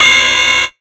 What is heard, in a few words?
HH FX; Ragga & Dub Reggae; USB Soundscan vol.27; AKAI